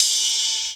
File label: Ride Cymbal